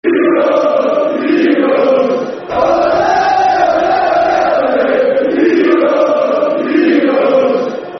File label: Ole Ole Eagles, Football Chants (Soccer Songs); Crystal Palace Cpfc